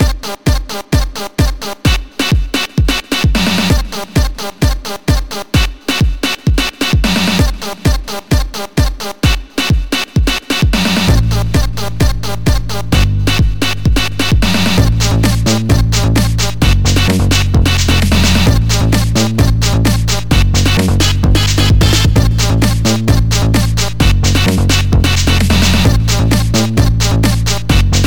radio noise, 4 track polyend tracker

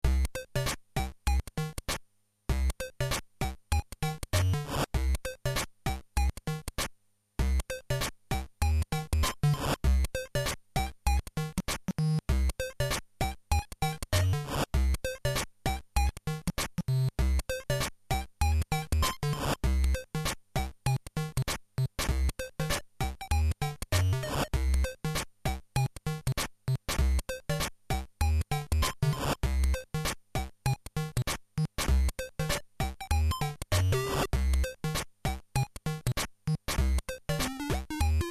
dubmetro; weeklybeats 2012

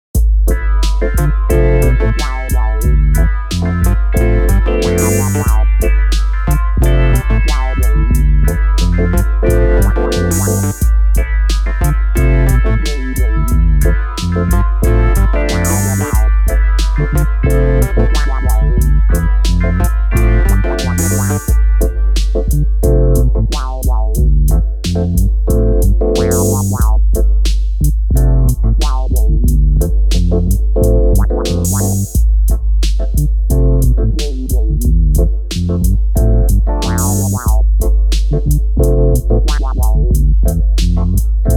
acid fank

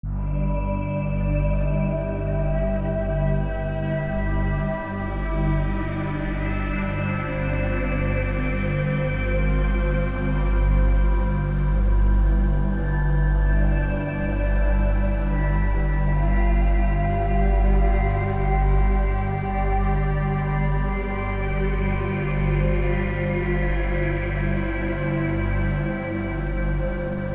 space ambient; forgotten ones

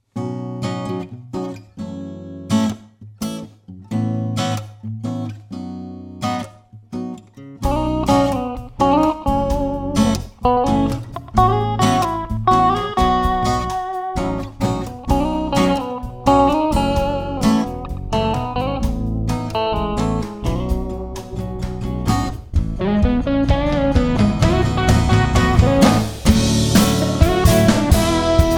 guitar jammin and dsp; fangin; weeklybeats 2012

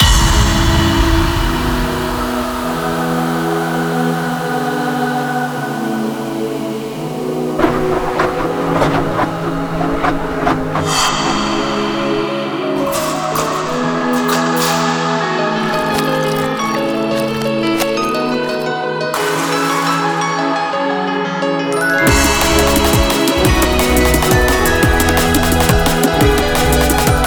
artcore drum n bass

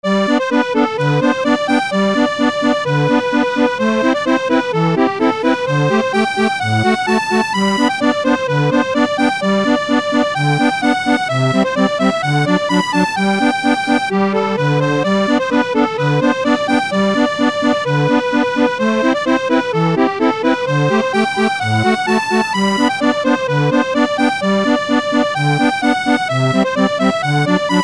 snes accordion solo

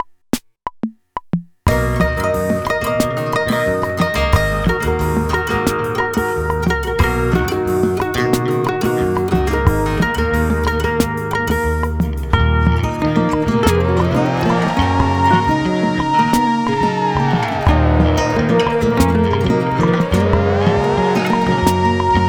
springtime bossa; weeklybeats 2026

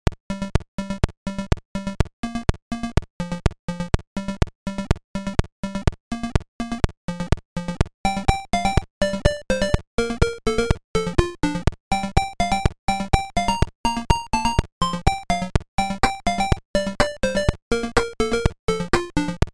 disco psg; weeklybeats 2024

